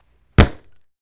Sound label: Football Catch Dull